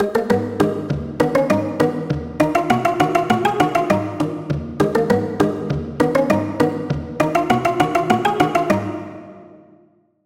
Pluck; Routed Sound; Serum 2 Expansion - Story Walker Vol.1

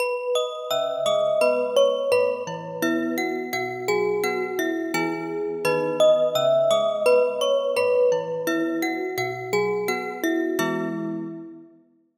Bell

